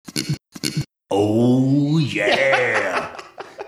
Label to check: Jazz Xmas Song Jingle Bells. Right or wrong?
Wrong